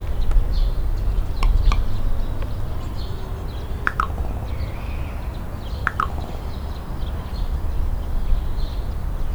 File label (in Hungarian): kattogó féle hangot hallat, holló; debreceni zoo 2012; marantz puska